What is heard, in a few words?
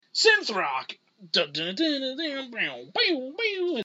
synth rock